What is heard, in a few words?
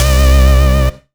Bass C, Offbeat Bass